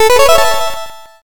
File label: level up